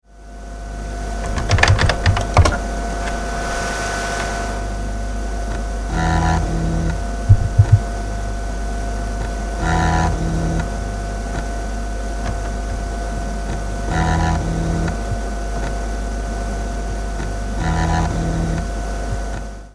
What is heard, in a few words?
Read Fail